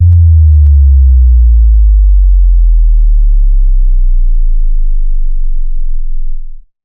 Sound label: Sub Drop